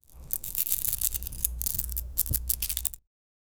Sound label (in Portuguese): um poder magico de tempo, fantasy